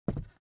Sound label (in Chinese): 挂画查看